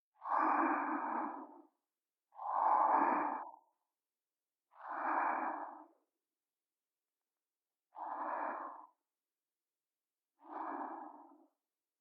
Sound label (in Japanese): 無知生徒の寝息(左); SEあり